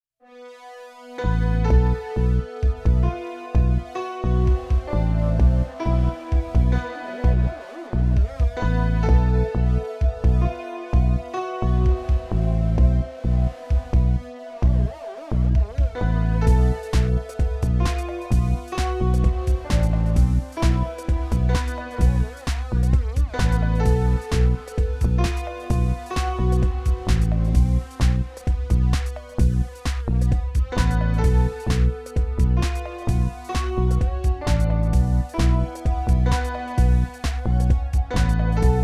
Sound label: Midi